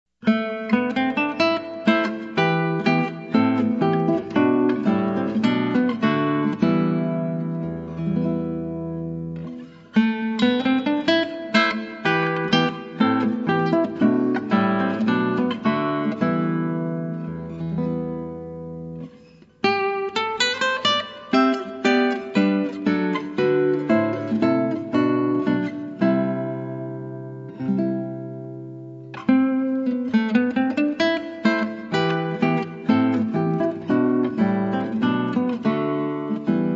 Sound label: aeolian air